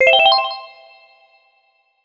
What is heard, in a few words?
Lap Count; donkey kong 64 hd n64 1999